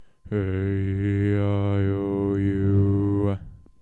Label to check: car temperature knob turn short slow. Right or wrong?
Wrong